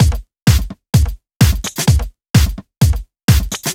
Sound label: House Beat, 128 BPM